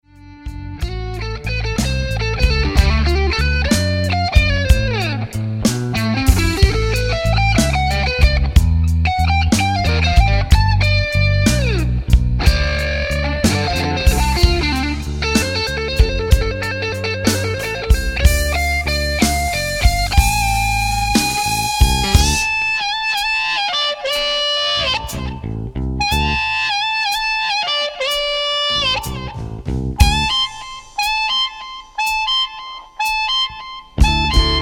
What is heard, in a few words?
blue cat blues